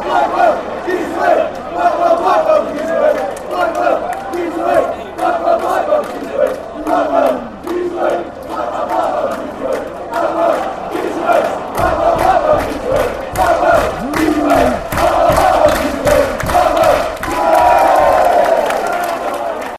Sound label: give us wave; blackwell